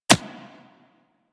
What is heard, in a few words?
Bullet Impact Generic; Surface